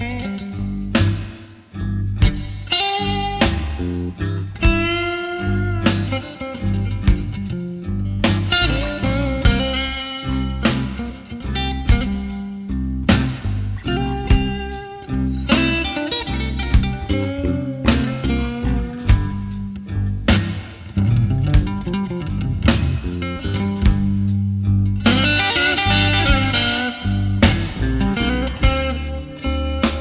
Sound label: blues